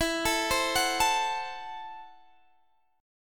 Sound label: C 6th Flat 5th E